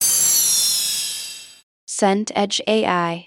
download done

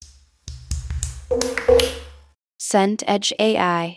footsteps barefoot tile

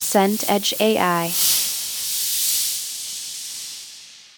transition whoosh; 8s

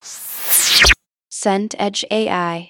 transition noise

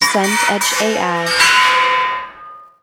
horror metal scrape long

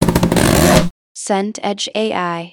tape dispenser pull

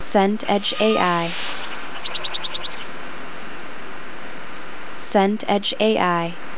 seagulls distant

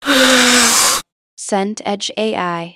breath in

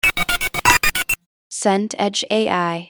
glitch data

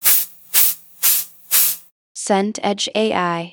swish double

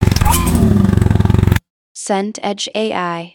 motorbike rev

